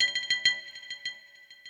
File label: FX Industrial 140